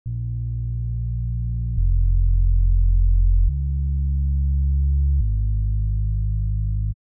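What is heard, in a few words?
140 BPM Break Bass, A# minor; Demo Constr Kits; Catch a Plane Kit; Bassline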